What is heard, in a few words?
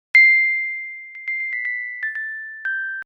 Rolling